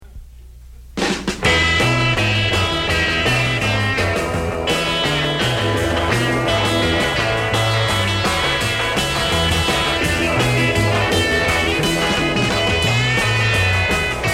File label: Radio music